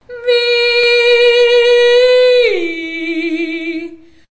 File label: me operatic, FEMALE SINGING; VOCALS and SPOKEN WORD